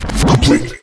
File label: deployable deploy complete; smite; vulcan